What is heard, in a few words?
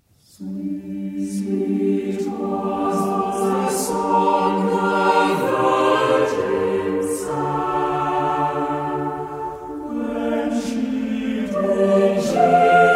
Traditional Lute-Book Lullaby